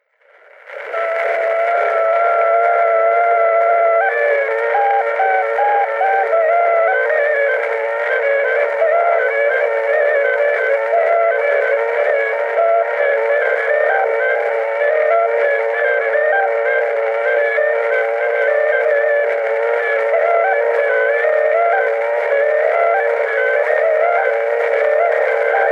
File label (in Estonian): Torupilli Jussi lugu, Emmaste, torupill, folk